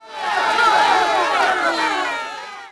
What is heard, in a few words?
CROWD NEG HIGH; Cars; XBOX